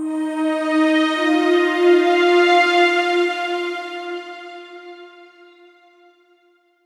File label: D&B Pad; Keys; neuro